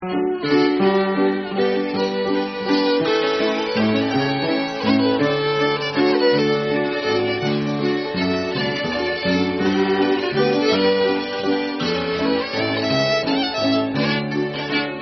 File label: Waltz D; Nameless Waltz